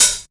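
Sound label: HH open hi-hat, Fresh Disco House; USB Soundscan vol.20 - Fresh Disco House I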